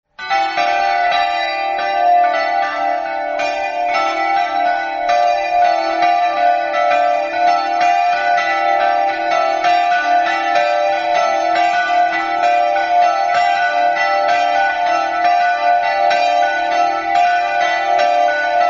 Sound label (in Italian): melarolo, campane